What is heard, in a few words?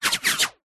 bullets whizz by